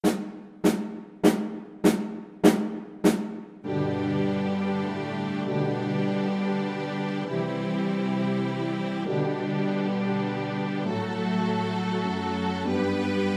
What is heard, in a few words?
minuet, flute